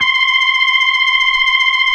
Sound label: C6F, B3